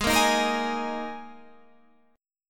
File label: G sharp Suspended 2nd chord, down